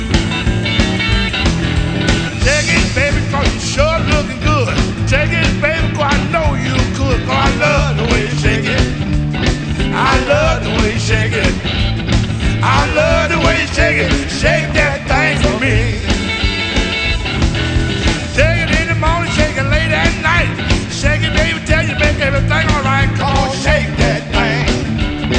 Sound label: blues